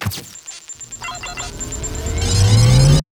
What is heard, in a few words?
silent mode start